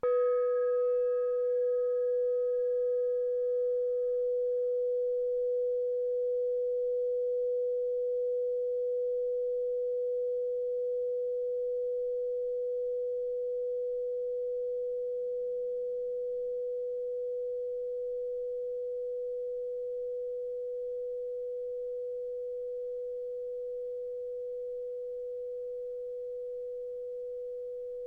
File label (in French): cloche tub 50 B